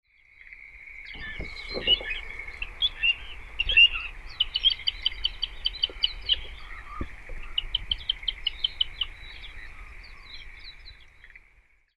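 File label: Little Ringed Plover, toyooka; koe; kochidori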